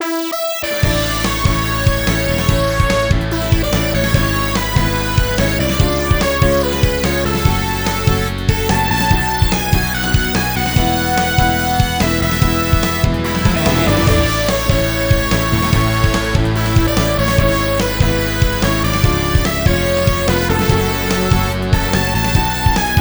BGM Minigame Fish; Kenka Banchou 6 Soul Blood; 3DS

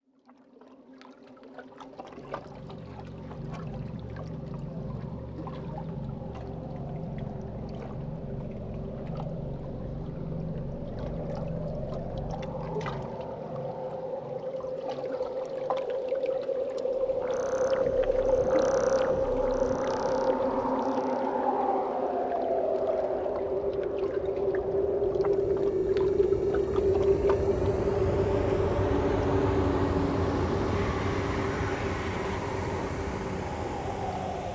space ambience; Worms 3D

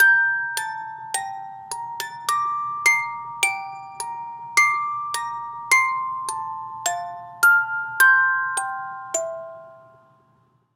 Music Box; Assassin's Creed Syndicate

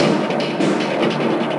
Desert Percussion

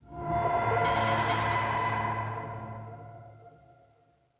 Dark Ambience Sting; dead rising 3 apocalypse edition

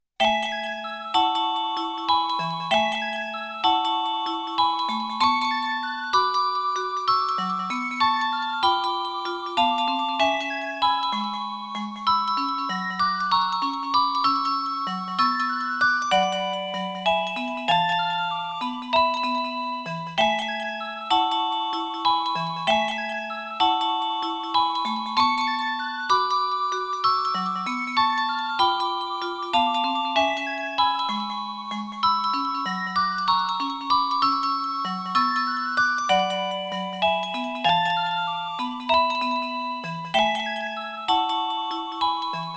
In the Rainbow Colored Town (Orgel); Quiz Nanairo Dreams Nijiirochou no Kiseki; Super Puzzle Fighter II X

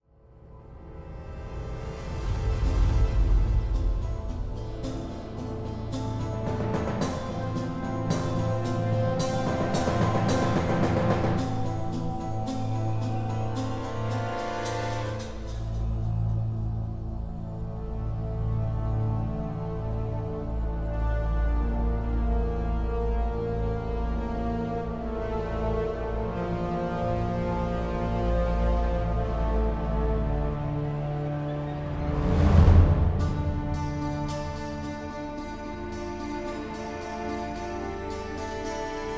Fight Theme Ambient Fast Start; ryse son of rome 2014

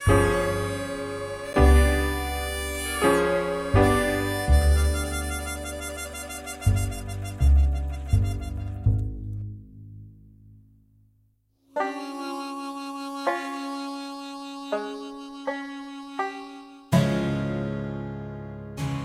Quirky

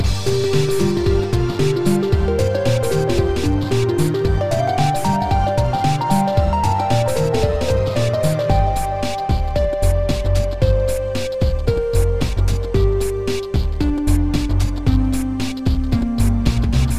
forest menu loop; super meat boy

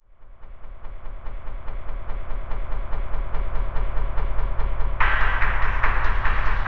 Boss Intro; Insanely Twisted Shadow Planet

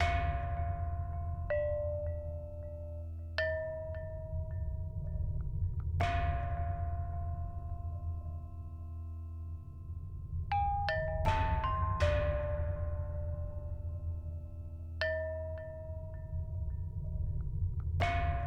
Music Gameplay State Neutral; Sifu; H4 Level Area=Cave Lvl1

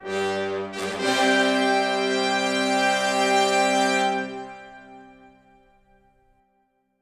Music Fanfare; Fable Anniversary

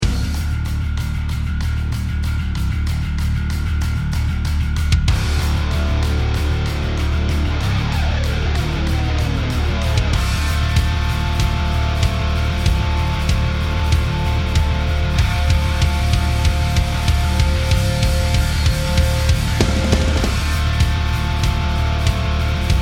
bomb planted

